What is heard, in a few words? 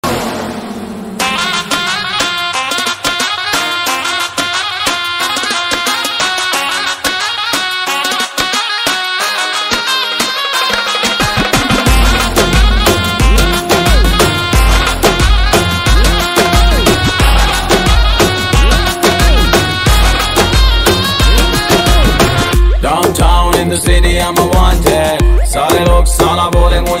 instrumental ringtone; salman khan